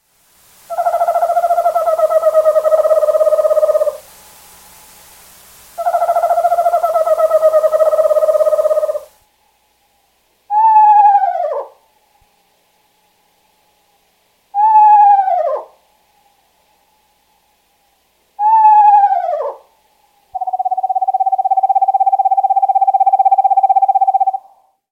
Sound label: screech owl